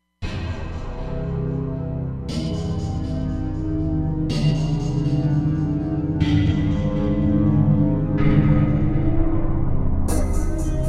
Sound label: Ambience